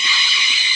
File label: subway sound; Subway Sound Construction Kit